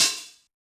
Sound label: DJX 2B HH, Yamaha